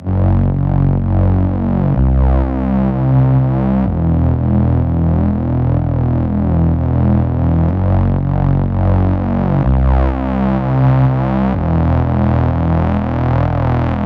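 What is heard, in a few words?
synth loop E minor 125 bpm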